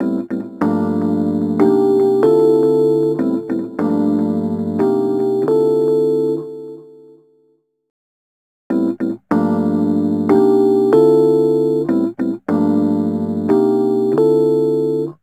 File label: Rhodes Attacks; Ueberschall